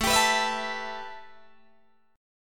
A 7th Suspended 2nd A Guitar Open D, chord

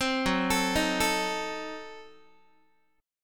Gb Diminished 7th, Guitar; arpeggiated; JGuitar

